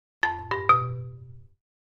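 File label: Marimba Accent Short; mallets marimba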